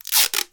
office tape sticky rip; sound effects one

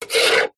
office pen biro draw line on metal box

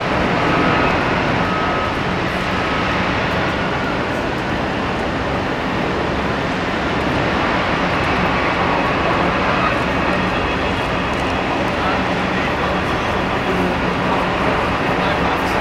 ambience construction site large ground zero nyc; sound effects one

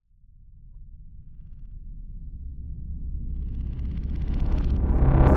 Swell Flutter; bottlerocket

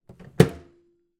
microwave oven plastic door close

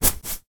household wheat heat bag drop on bed

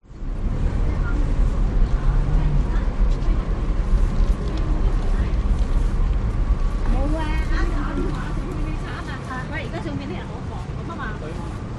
New York Chinatown; audio hero

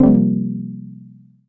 multimedia alert incorrect try again; dusty room

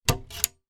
household hi fi cabinet door close; zapsplat

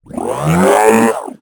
Robot; little robot sound factory

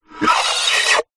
horror monster demon screech

